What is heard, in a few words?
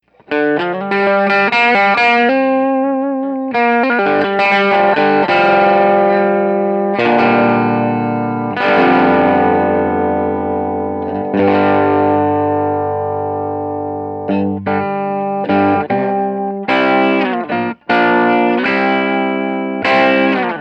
Treble Position